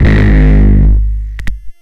Chord 808; AMG - Prototype Drum & Bass

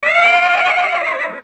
Horse, Yamaha DD-35

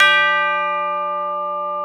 TUBULAR D3; InVision Interactive; Lightware VOL-1

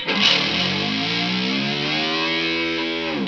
GUITAR, POWER GT

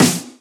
Snares; Vengeance - Essential Clubsounds Vol.4

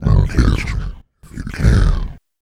VOICE FX, Dance Vocals

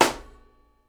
SNARE, Live Snares; PlatinumPhattCD1; E-MU Producer Series Vol. 8 – Platinum Phatt

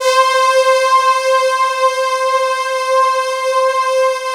JD800, PAD; Alpine Volksmusik

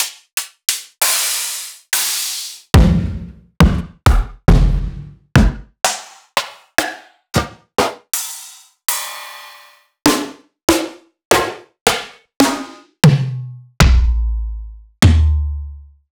Heavy Acoustic Drums; Antidote

